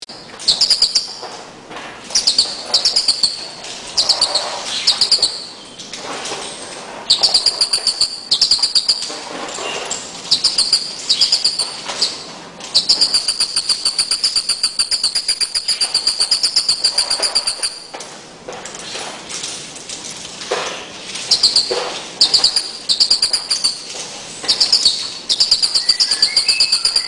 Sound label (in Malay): burung rio